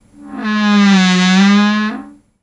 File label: Closet Door Creak Lo